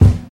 short bass drum sound g key, kick drum sound tuned to the G note